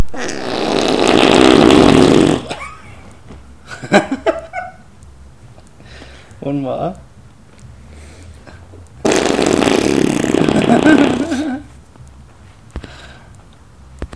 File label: arm fart